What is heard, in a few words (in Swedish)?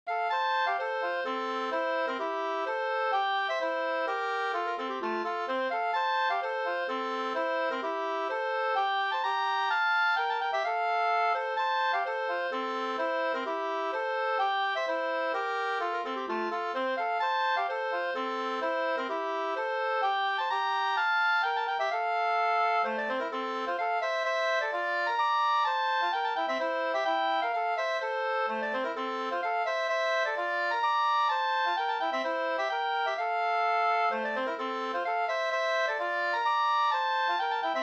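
polska Bodapolska, F major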